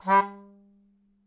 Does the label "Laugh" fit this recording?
No